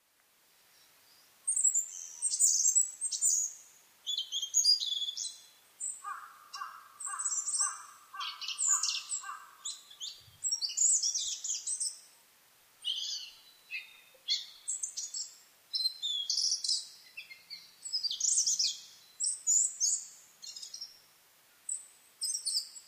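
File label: Asian brown flycatcher; kosamebitaki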